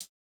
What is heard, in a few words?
Jazz hop hihat; Hiphop